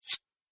Single Swipe, whoosh

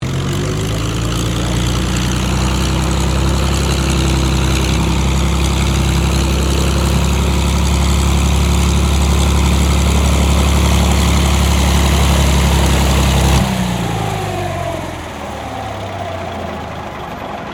AIRCRAFT P-40 KITTYHAWK SHUTDOWN; P-40 Kittyhawk / Warhawk / Tomahawk; Airplanes; Vehicles

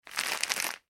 Plastic Packaging Movement; Plastic Packet; Materials; McKinney Sound

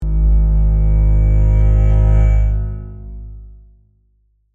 Synth, Stab, Reverb; Stab Sound Effects; Sound Design; BlastWave

